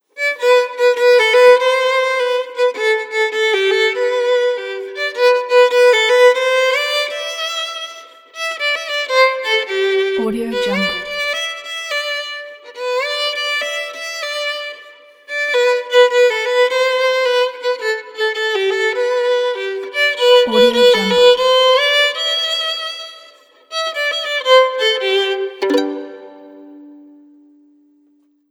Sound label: solo violin; soundsvisual